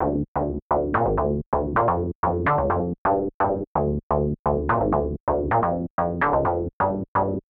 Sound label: bass; whisper